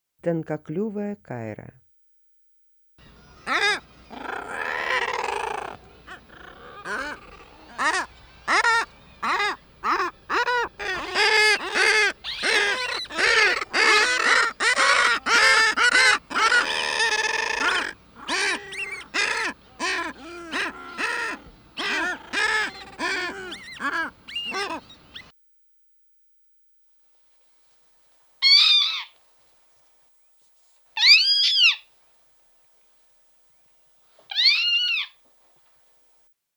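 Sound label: common murre voice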